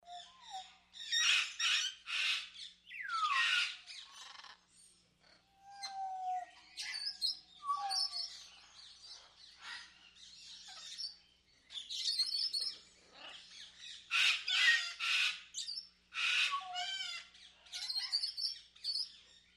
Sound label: Exotic African Birds